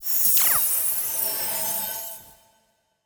Transformation Spell Fantasy Magic

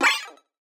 8Bit Arcade Short

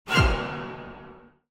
Single Strike Cartoon

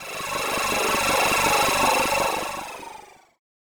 Rapid

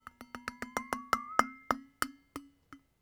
Comic Steel Water Bottle Taps

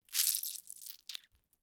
Lemon Squeeze Squelchy

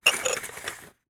Oil Lantern Glass Chimney Handling

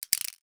Combination Lock Dials Clicks

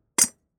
Oil Lantern Various Metallic Handling

